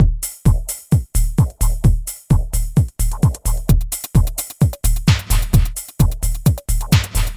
Beat Smooth Inflation; VariSaturator

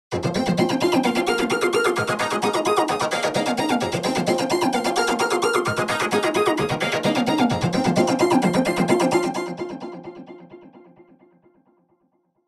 Arp Synth - Warm Guitar; Spatifier

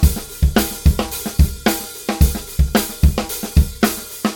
110BPM Chorus Accented

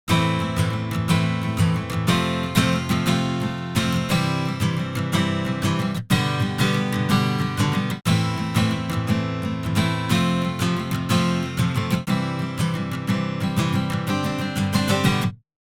Strumming Guitar; Spatifier

